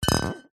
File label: aerosol cans x2 deodorant bash together; household